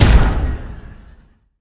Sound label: large hit; smite; chronos